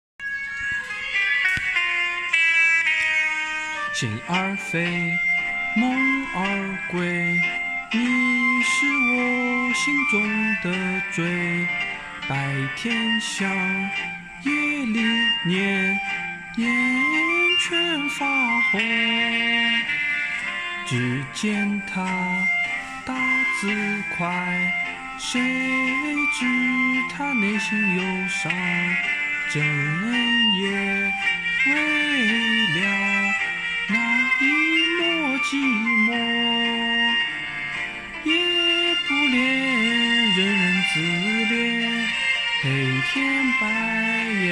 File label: sing